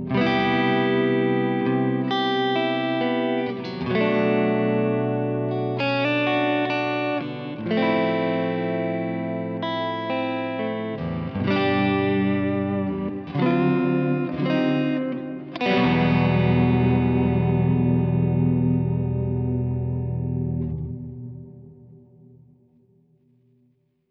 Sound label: Reverb Hall